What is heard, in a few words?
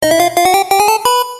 Pacman Techno